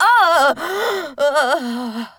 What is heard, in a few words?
Death; Daphne